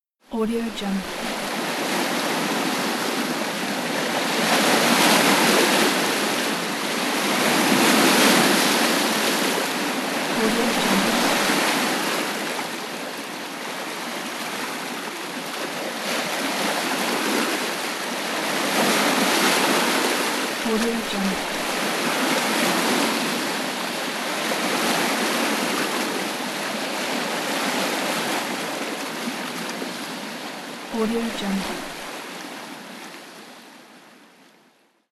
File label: ocean